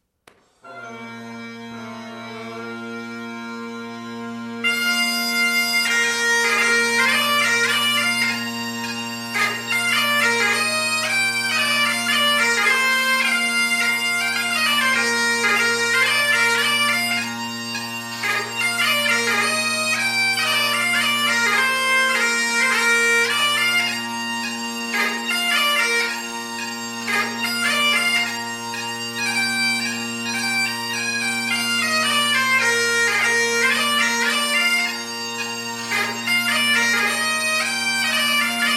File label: Piping Duo Scotland